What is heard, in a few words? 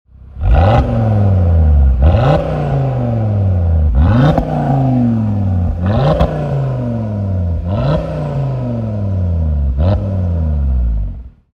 ABT RS3 revs, abt audi rs3 8v sportback; abt power r 500 ps limited edition